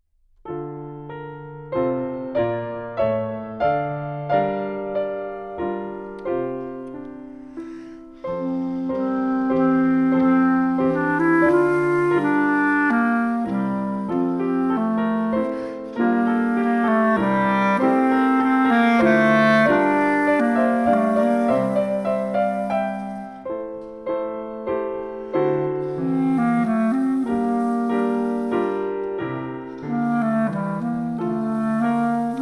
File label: Skilful solo; Skilful Solos